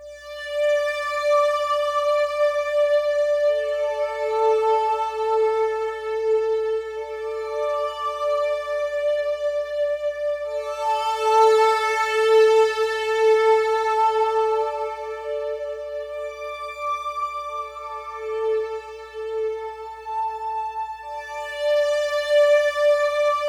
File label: synth Dm Am; blindmime; atticus